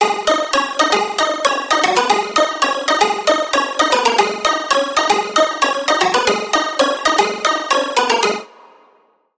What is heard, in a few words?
Pluck; Press Start; Routed Sound; Spire Expansion - Hyper Gamer Vol.1